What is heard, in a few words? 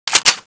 draw; custom weapons; cso; vulcanus3